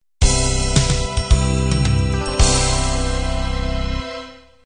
windy beat end

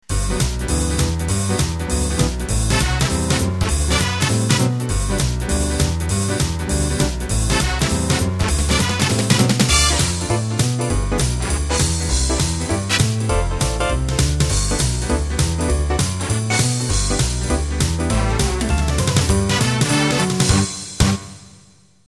New Jive; Works for very fast swing, jive and jump blues tunes; New Jive X & New Jive Y

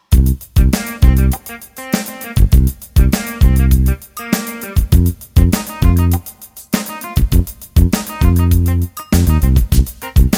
16beat

